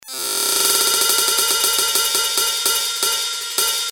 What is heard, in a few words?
ride